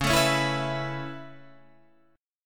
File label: C sharp Minor 7th Sharp 5th C sharp Guitar Standard; C#m7#5; strummed; JGuitar